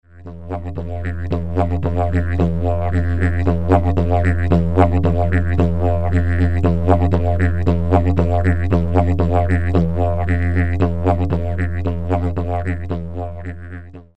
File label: F didge, Didge aus Eibe, Ton: F; Didge aus Eibe von Jan Ole Haber